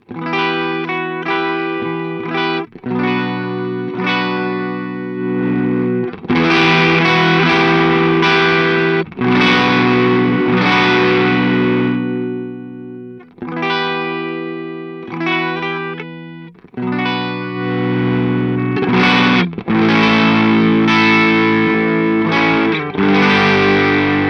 Earthquaker Devices Zoar Clean up Gitarren Volumepoti

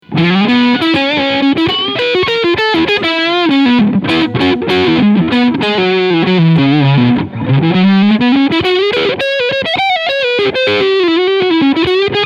GL Tribute Asat Classic Bluesboy E-Gitarre Front Pickup Overdrive

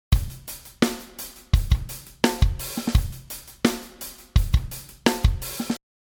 Loop Bus Kompressor

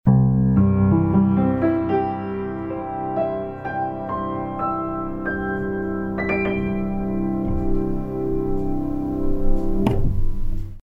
Piano, Olympus LS-P2

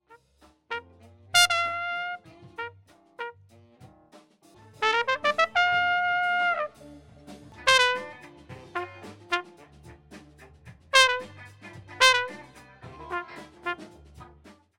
Portico 5024 Sm58 silk TRompete

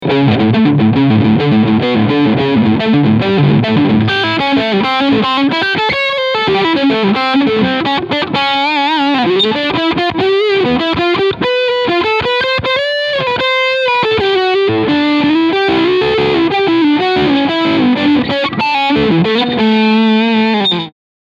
Kramer Guitars Focus VT211S Overdrive Steg Middle Pickup

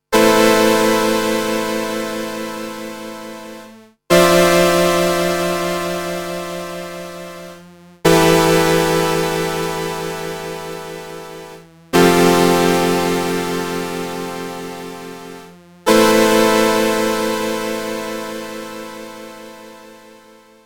Juno-60 Chords trocken

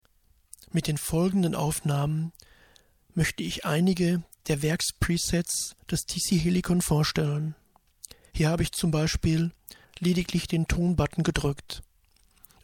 Tone Button gedrückt

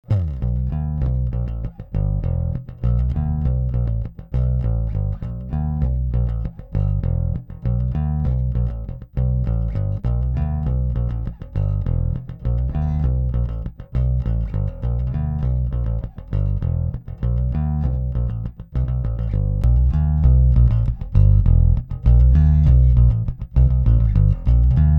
Rickenbacker EQ flat dann Comp dann Maximizer; BBE

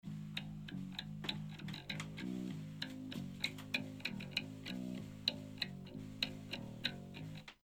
Groove Bass mit Ear Box

